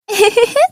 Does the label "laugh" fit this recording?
Yes